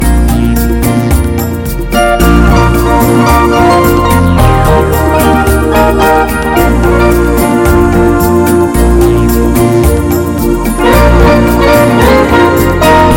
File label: Great Orchestra Bossa Nova